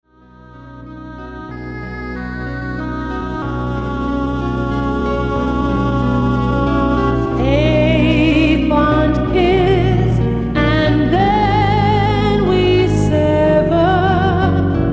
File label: Ae Fond Kiss (Duet)